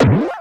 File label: Bowser Jr Land